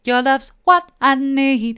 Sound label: mid, vocal